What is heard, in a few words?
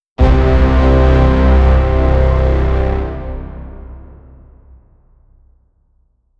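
very heavy dramatic horn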